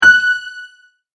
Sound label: multimedia tone correct